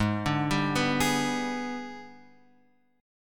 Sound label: Ab Minor Major 7th Flat 5th, down, Guitar, Open F; AbmM7b5; arpeggiated